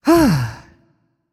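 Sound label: sigh, female voice; smite; voicepack; hera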